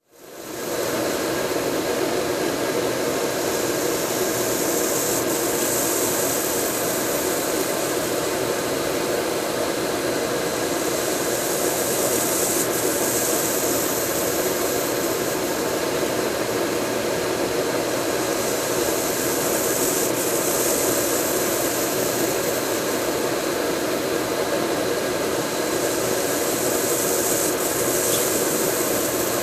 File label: Heavy Engine Noise